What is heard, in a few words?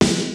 KICK SNARE; Kid Nepro; Elite; KICKS SNARES